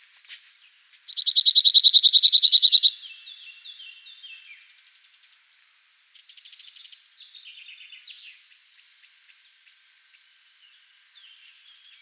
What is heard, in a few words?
call, cirl bunting, Emberiza cirlus, adult male, Background: Blackcap and Chaffinch song; This song type is slightly different from previous ones